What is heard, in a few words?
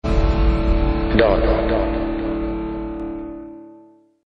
SMS Ringtone